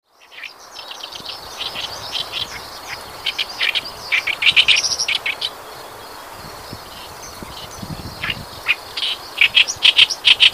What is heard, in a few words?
ooyosikiri, birds